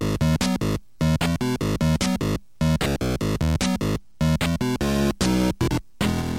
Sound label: video game, electronic loops